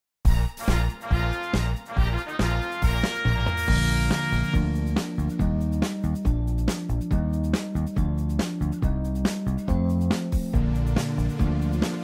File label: intro instrumental; Gummi bears intro